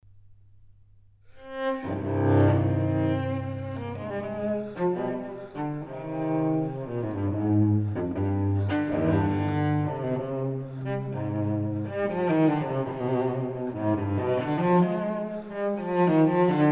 Suite for solo cello No. 5 in C m; Bach; Cello Suites

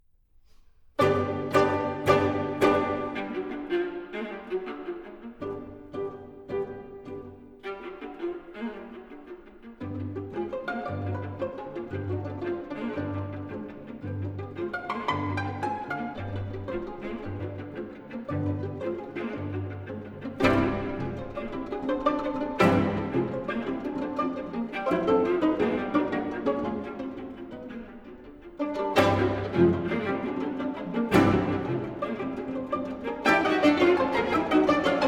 Assez vif et bien rythmé, String Quartets; Ravel & Debussy String Quartets

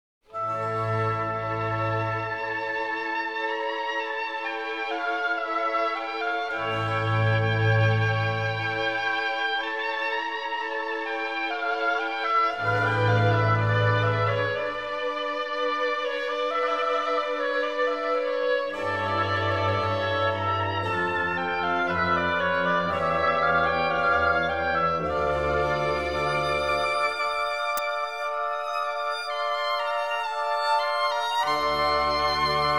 Concerto in F Major, Adagio; Concerto No 1; BWV1046; Bach; Bach The Complete Brandenburg Concertos

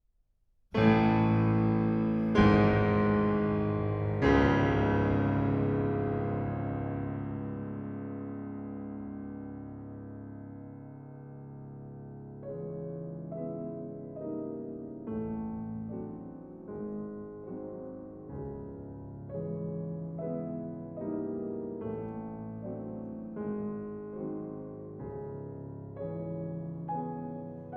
Prelude Op. 3 No. 2 In C Sharp Minor

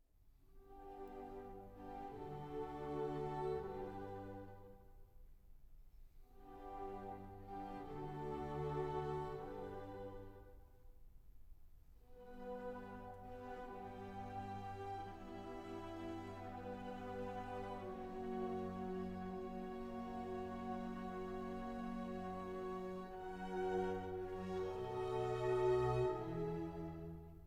Violin Concerto In D; Academy of St. Martin in the Fields; Beethoven Violin Concerto

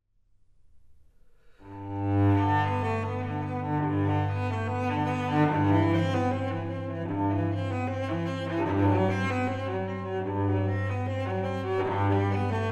Suite for solo cello No. 1 in G; Bach; Cello Suites

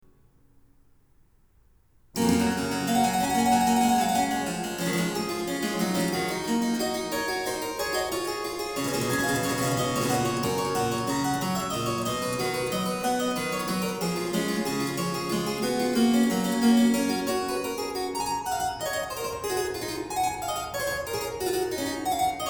Harpsichord

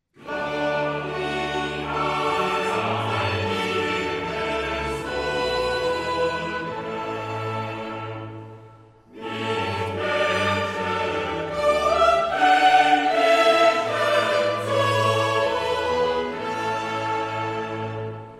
Cantata Choral; J S Bach; Wachet auf, ruft uns die Stimme; BWV 140

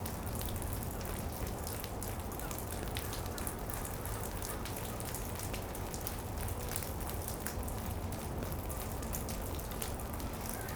Melting Ice, Field Recordings Oregon; Omni Gardens

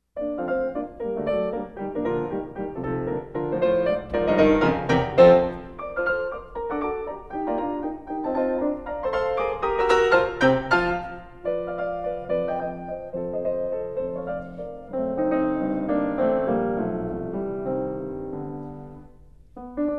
Piano Sonata in B flat

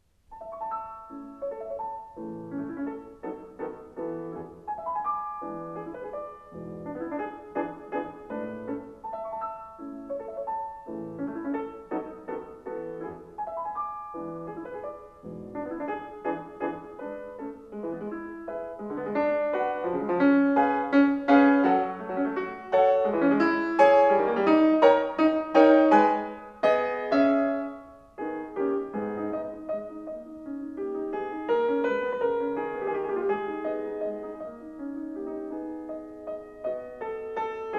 Piano Sonata No. 2 in A major; Wilhelm Kempff; Beethoven; Piano Sonatas

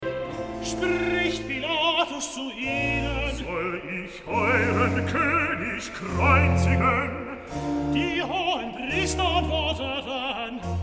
Recitative; Bach, J S; Johannes-Passion, BWV 245, Pt. 2; XXV. Recitative. Spricht Pilatus zu ihnen

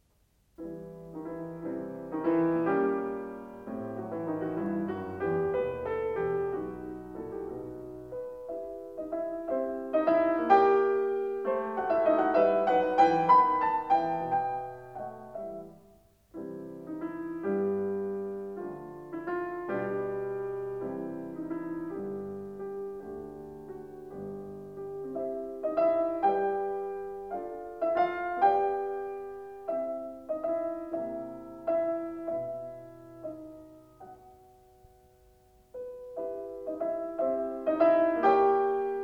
Piano Sonata No. 9 in E major; Wilhelm Kempff; Beethoven; Piano Sonatas

